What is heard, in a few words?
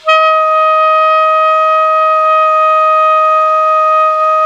ALTO PP E 4, SAX; Greytsounds - Philip Wolfe David Crozier's Saxophones